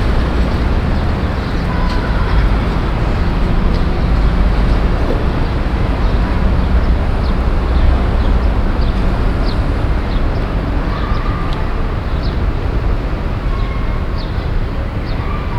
auf der strasse, Brasilien

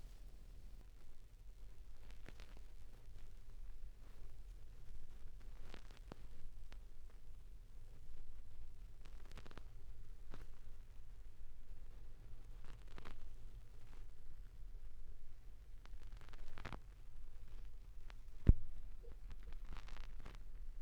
Schallplattengeraeusche (16rpm); wendelrekords 1.2

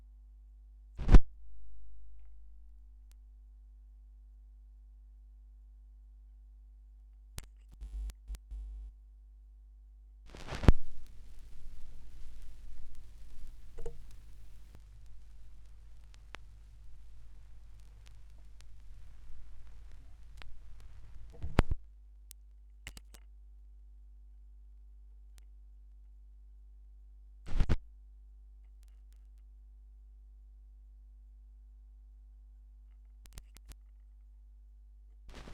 schallplattengeraeusche

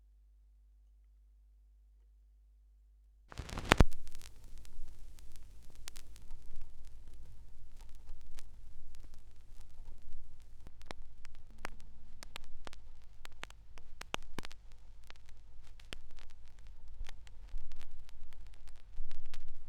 schallplattengeraeusche

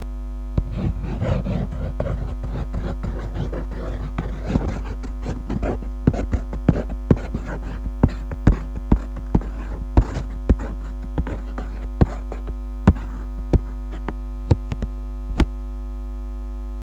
pappe windowcolor; rauschpartikel

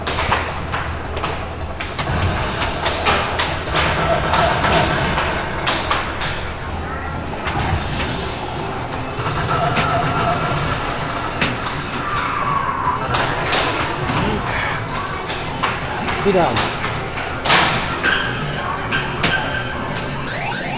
Am Flipper, Fieldrecordings, Brasilien

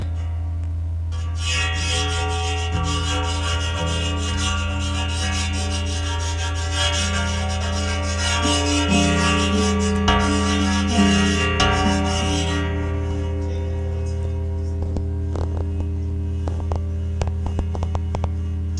kreide saegeblatt; Pistazie und Konsole

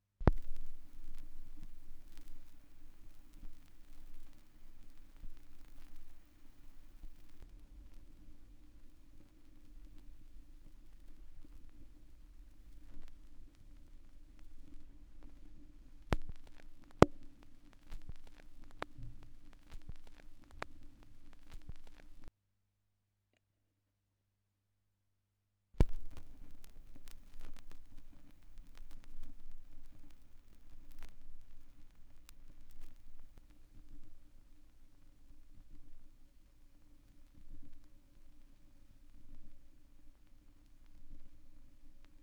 schallplattengeraeusche

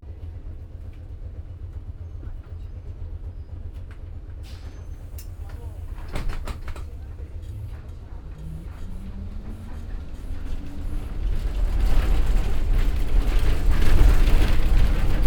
im bus, Brasilien

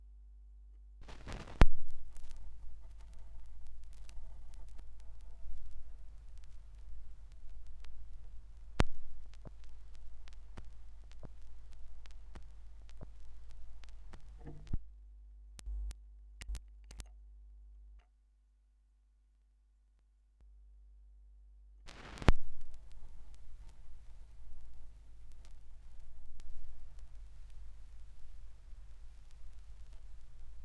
schallplattengeraeusche

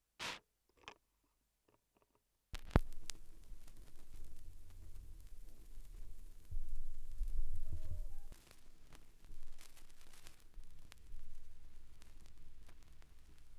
schallplattengeraeusche

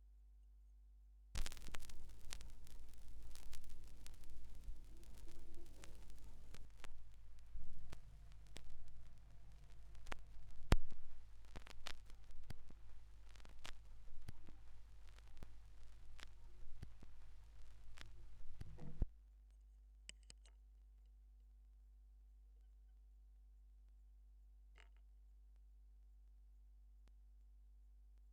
schallplattengeraeusche

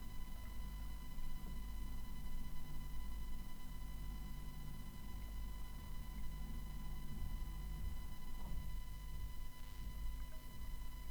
kuehlgetraet zuhause; kuehlgeraet zuhause

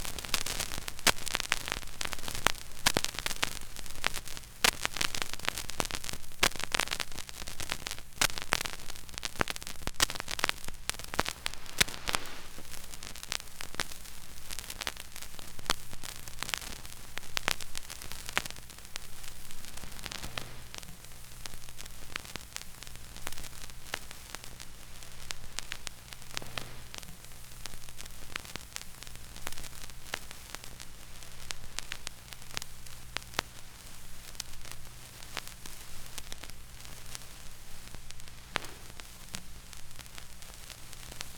Schallplattengeraeusche

